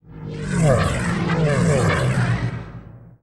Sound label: sfx sci fi; Masterbits - Switch FX